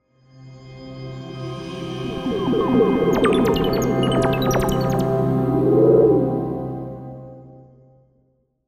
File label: Spaceship Assistance